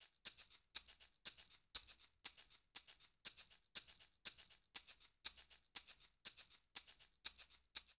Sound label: Jazz Funk Maracas 120bpm, Latin Percussion; Ableton; Factory Packs